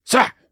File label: worm attack